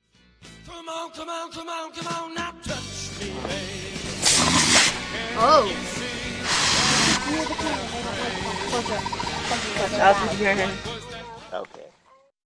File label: raccoon; Visit to Reno - August 2005